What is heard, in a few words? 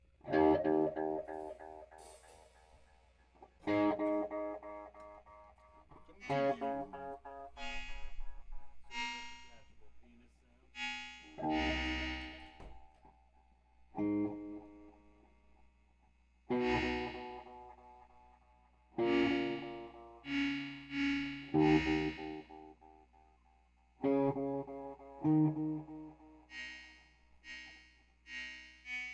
noise